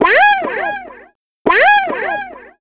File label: Slide It Up 165 G, Guitars; Total Drum&Bass